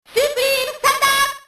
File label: supreme thunder